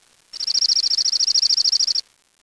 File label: JUNCO